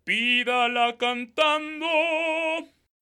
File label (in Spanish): pidala cantando